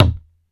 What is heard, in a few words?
Foley Tub Tom; essential drumkit